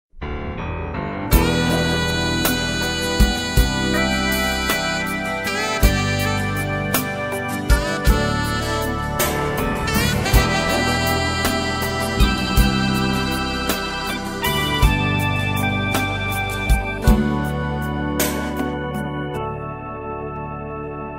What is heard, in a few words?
victory